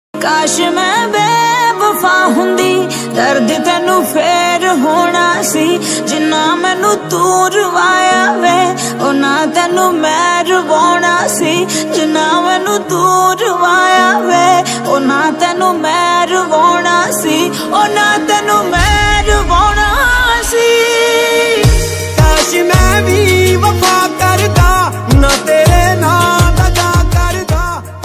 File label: female punjabi ringtone